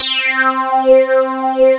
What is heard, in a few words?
POLY REZ C4; REZINOID; Synth